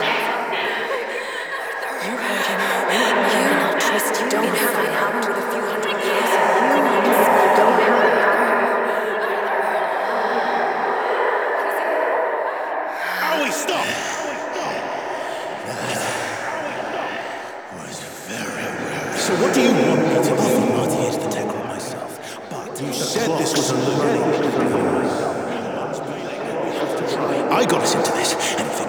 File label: Crawl Hex Vocals; gameplay; Warframe; NineteenNinetyNine; Stage11FuturePast